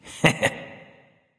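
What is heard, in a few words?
"heh heh", nonverbal; smite; khepri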